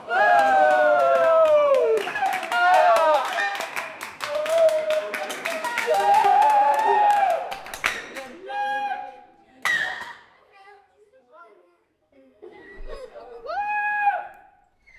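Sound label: company of 5 young people, beer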